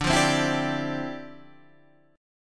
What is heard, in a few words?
D Minor 11th D down Guitar Standard; Dm11 strummed; JGuitar